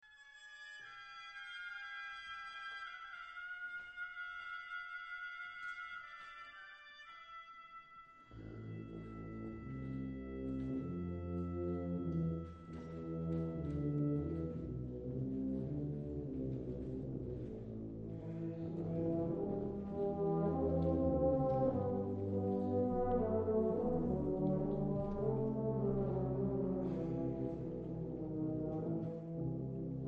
brass

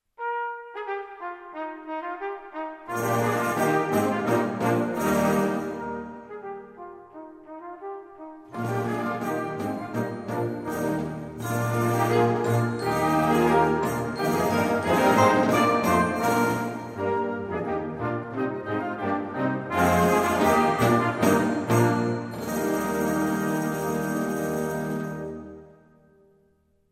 English carols

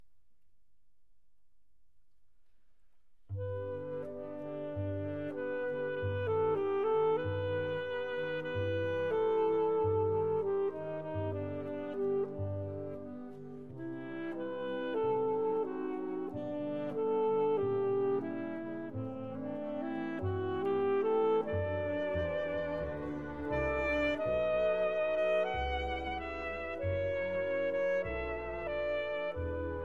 concerto for alto saxophone and band

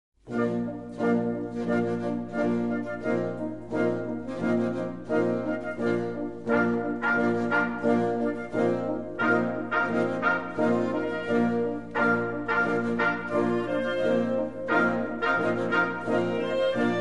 Ensemble

